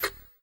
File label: Channel switch; miside demo